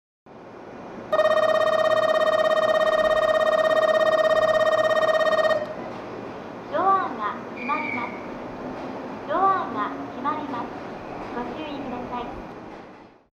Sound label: tennoji bell; jrwest